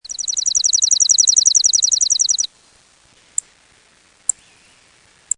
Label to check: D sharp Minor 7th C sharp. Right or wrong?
Wrong